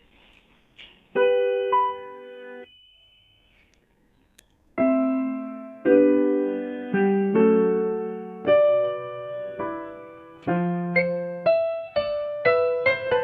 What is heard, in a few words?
jingle